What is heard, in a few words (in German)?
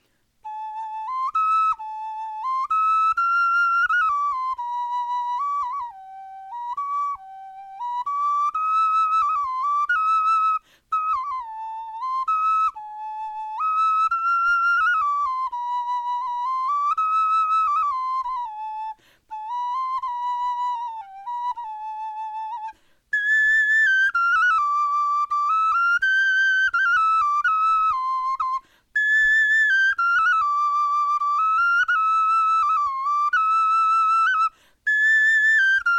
melodie